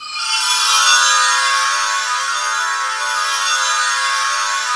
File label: CASCADES; Q Up Arts; Dream Experience